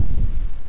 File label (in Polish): UPADEK